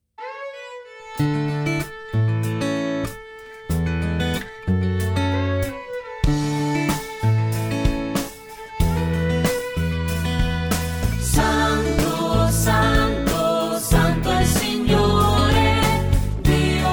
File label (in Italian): violino; santo